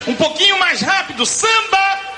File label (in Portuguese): um pouquinho mais rapido samba